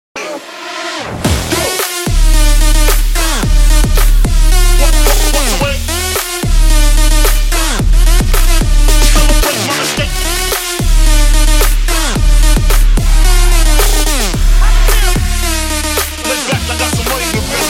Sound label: Trap Remix